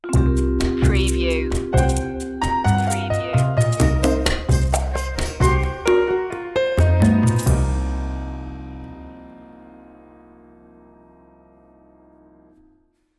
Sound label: jazzy opener; variation a